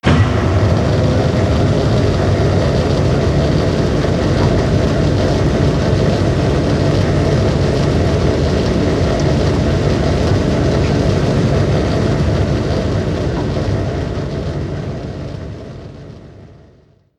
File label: Ball Hall Bridge Retract